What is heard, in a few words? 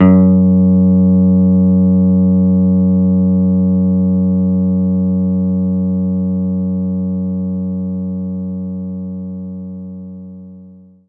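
Jazz Guitar F sharp 2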